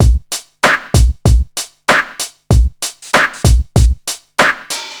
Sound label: 96 bpm drum groove A sharp key, kick tuned to the A# note